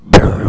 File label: bump